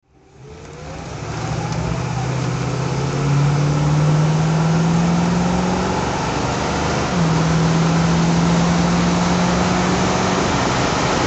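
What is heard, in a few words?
jensen cv8 drive, Engine sound driving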